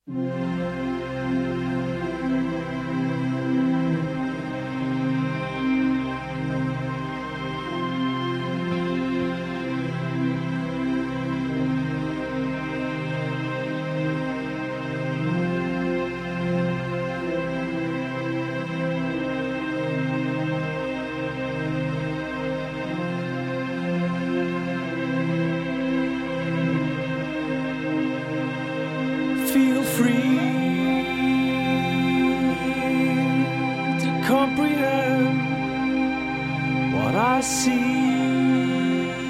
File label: Harmonium